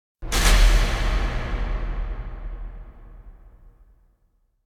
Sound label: Impact Heavy Blow Strike; Hollywood Edge; sound designer's toolkit v2